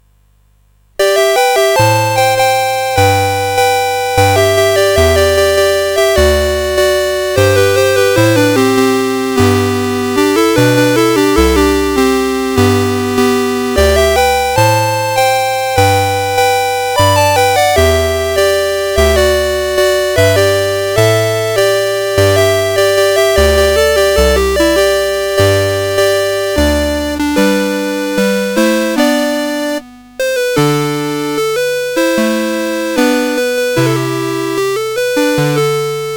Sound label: CSG8580R5